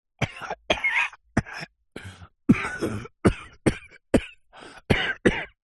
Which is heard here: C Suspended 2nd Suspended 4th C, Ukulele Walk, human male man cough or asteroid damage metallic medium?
human male man cough